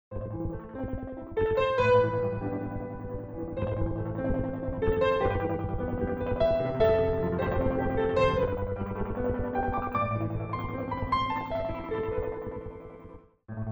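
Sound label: chorus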